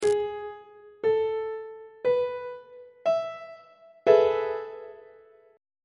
g double sharp major 7th suspended 2nd 3rd inversion on treble clef